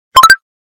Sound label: snap